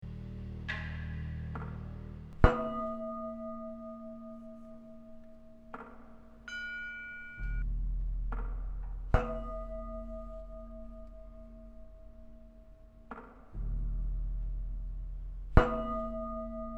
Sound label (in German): Glocken